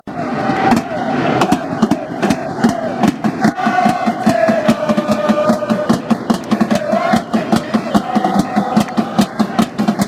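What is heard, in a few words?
reminiscence, football chants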